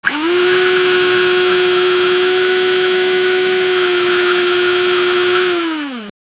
blender and orange sound, food preparation

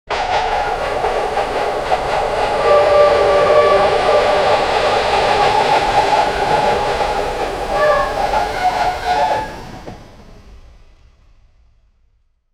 steam train departing fro, steam release

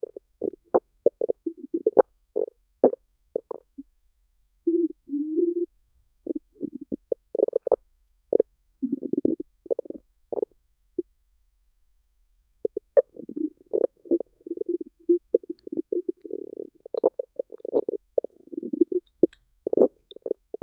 soundscape of fetal sound, amniotic fluid

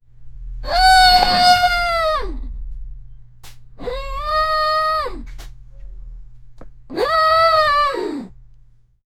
cow sound; animal call

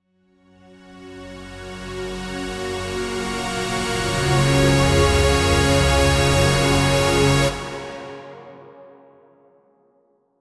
synth whoosh transition

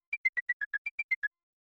logo sound, branding; dolego

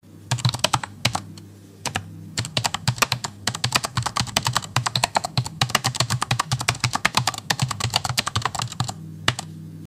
an old keyboard typing so

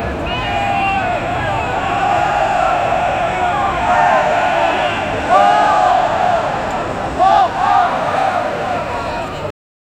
a large crowd of people, politics

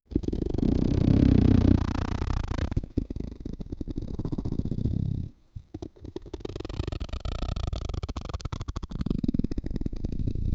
the sounds should emphasi, blinking

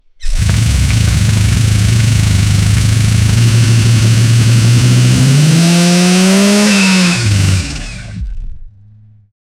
flat plane crank dest, spool; flat plane crank 40l dest

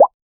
bubble based ui sound

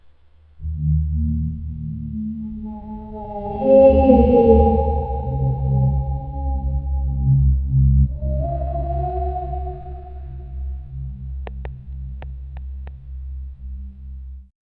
a mysterious low sound, ritual

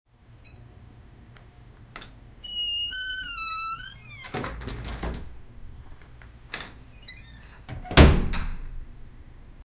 opening the apartment doo, apartment

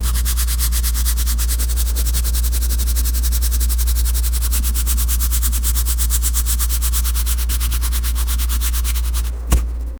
brush strokes

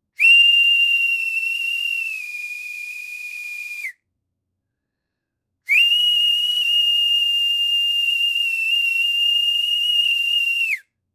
refree blowing a full tim, event

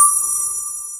create ui and menu sound

